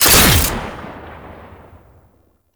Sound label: Shot 3D; Warframe; Fulmin Prime